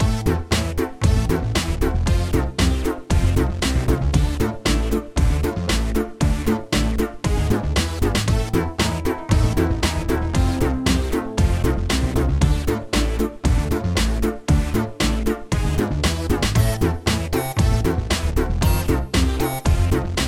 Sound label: Main Theme LOOP; Death by AI